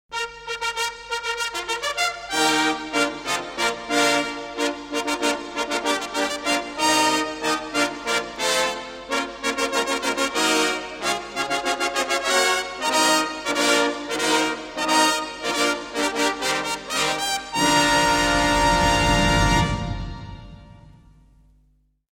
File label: FANFARE